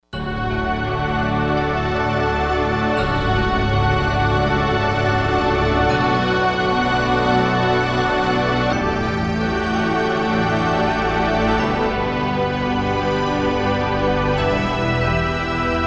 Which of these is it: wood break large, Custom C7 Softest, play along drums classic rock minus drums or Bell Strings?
Bell Strings